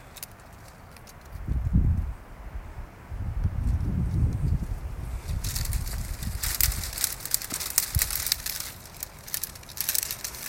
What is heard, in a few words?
Walking through the scrub at Wreck Beach Farm